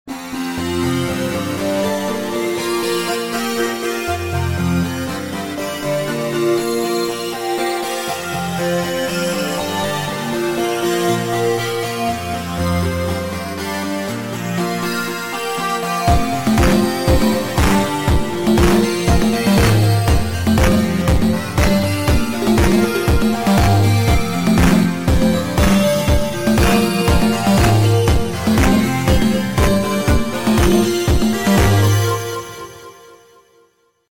VITAL ONE OSC MOD

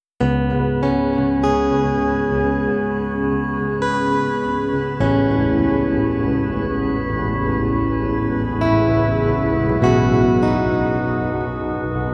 CHROMAPHONE 2

